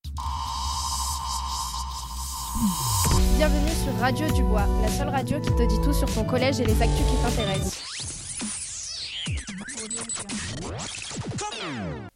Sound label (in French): jingle